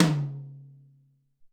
Pich Tom, Alesis SR-18

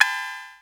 HH Open, Vermona DRM1-MK2 Hi Hat

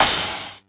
percussion one shot crash high noise; yellow; Sample Magic; Classic Chicago House; Drum Hits

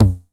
909 TOMS; Wizoo - Platinum 24 Electronic; 909 TOMS P2

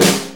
Snare, Snare Drum; Drum Tools

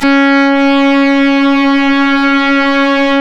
C#, strings; Big Fish Audio

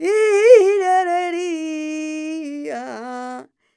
voc b, Flamenco Female Cantaora; Best Service ProSamples vol.46 - Flamenco; Vocals; Female Cantaora 2

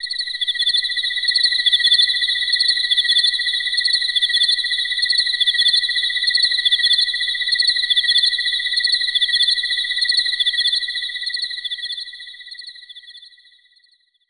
synth sfx; Chillout (ambient1&2)

